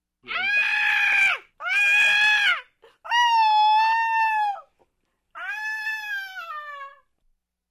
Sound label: Crazy Screaming